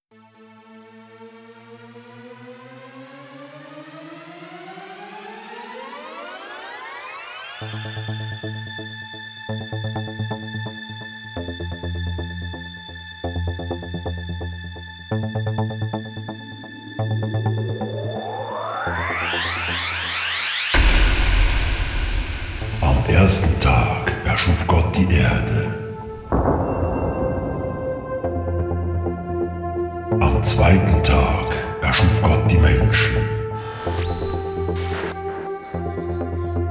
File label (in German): Schlager Intro ohne voice; eventbar